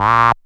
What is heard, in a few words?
SYN TON; E-Lab; X Static Goldmine 2; akai